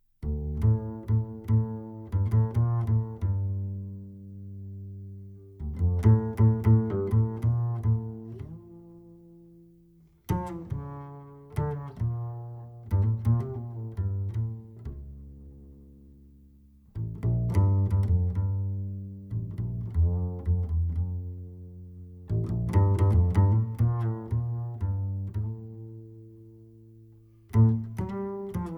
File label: Bass Solo